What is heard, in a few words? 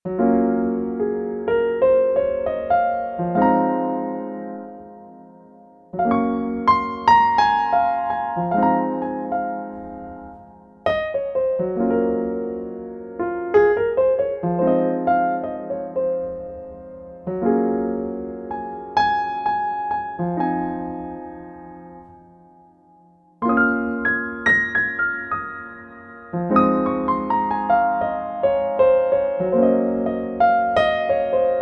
piano